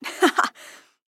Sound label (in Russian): zvuki zhenskogo smekha